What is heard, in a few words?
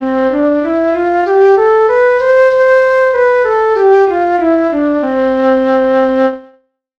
tempo 150 percent